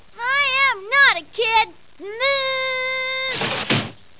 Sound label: kid; skuld - starmage